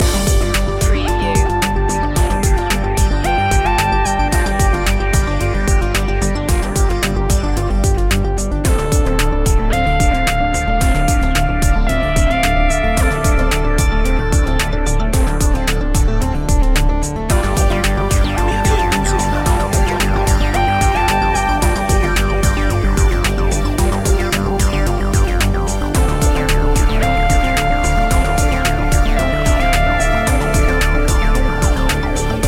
future technologies loop

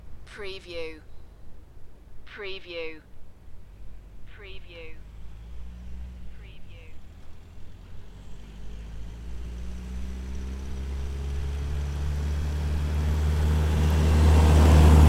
Welsh Forest car park cars drive by; beanstalk audio

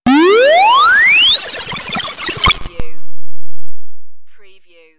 Digital Alert Overload Upwards

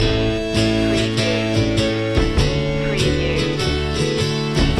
circus loop

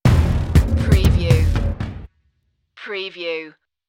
voodoo dance stinger

